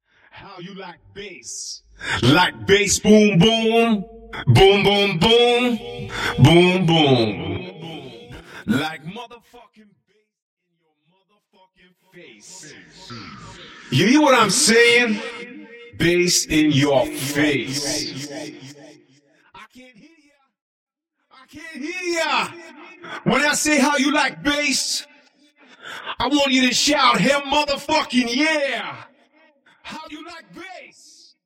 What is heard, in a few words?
acapella